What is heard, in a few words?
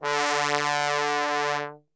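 D bass trombone, Brass Super Section; Best Service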